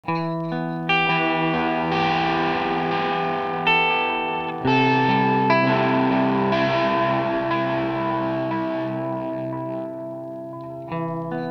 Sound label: Rewind Lofi; Alexander Pedals